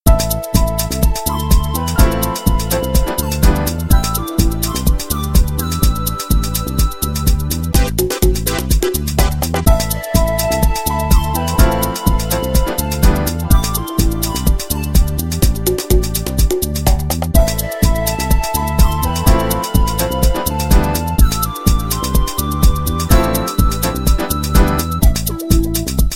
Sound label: Ambiance Cool